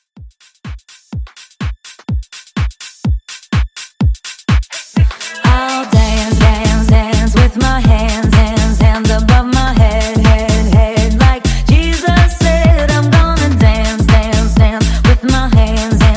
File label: House 125